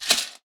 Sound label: Cat Food, household rhythm samples Hits